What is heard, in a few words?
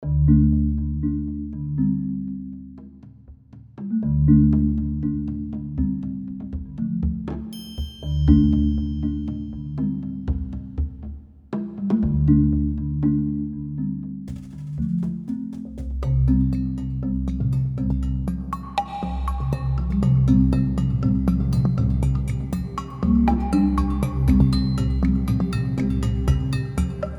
120 BPM